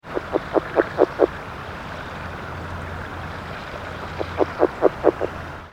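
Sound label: rdraytonii short, frogs; This is a 5 second recording of a series of two advertisement calls of a single male frog.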